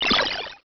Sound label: Get Powerup; infiltration